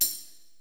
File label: tambo, Percussion; realdrum